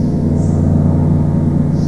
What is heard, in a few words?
city vent pump loop